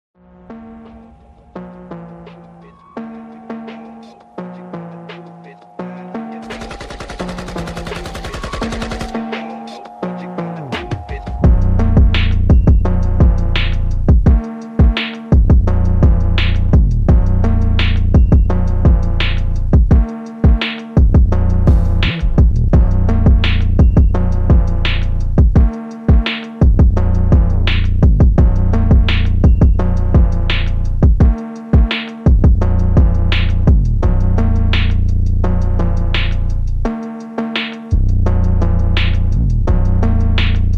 PHONK TYPE BEAT

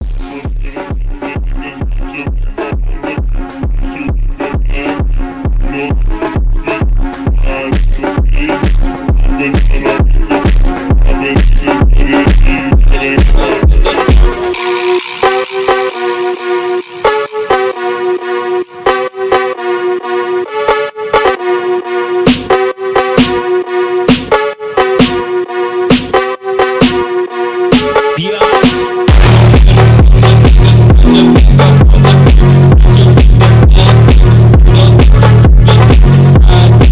Phonk House

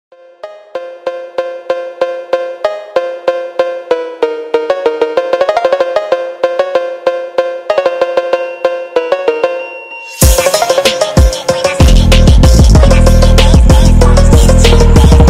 Phonk, Hard phonk; Japan Drift

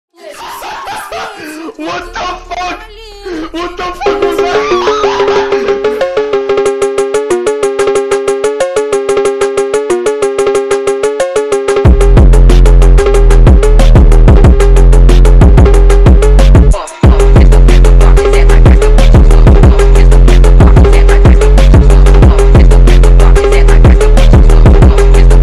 Jdm drift Phonk; Saint-Petersburg

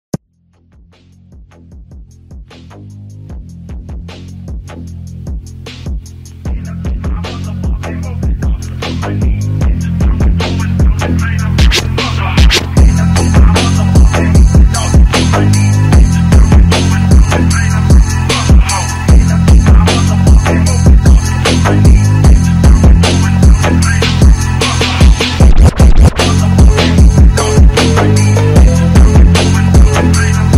Phonk Drift

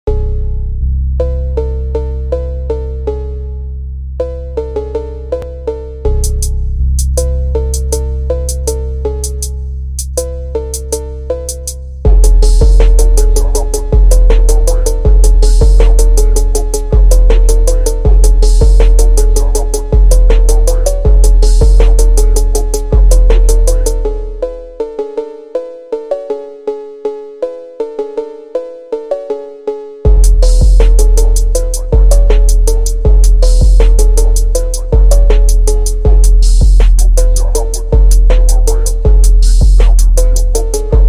Phonk

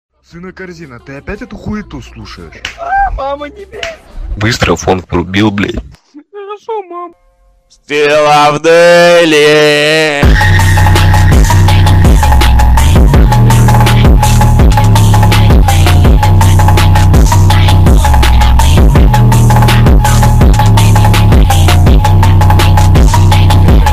phonk

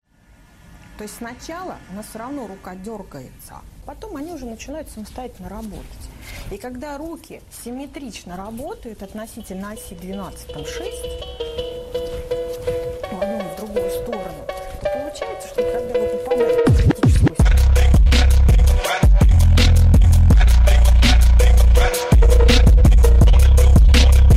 Drift Phonk

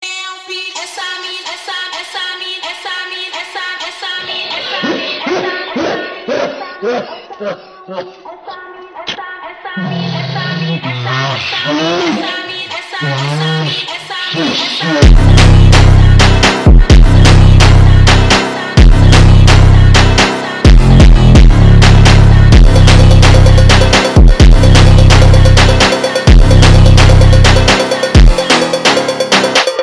Brazilian Funk + Phonk; Tik Tok Remix 2023

